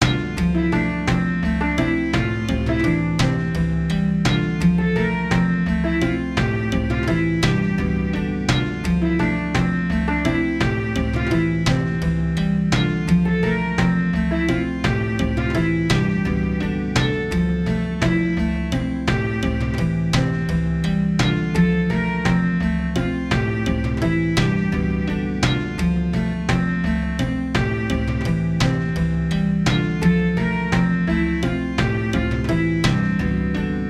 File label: Music Tavern